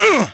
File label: Ranger Pain